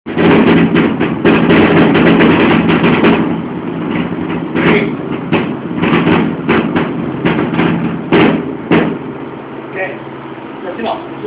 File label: Thunder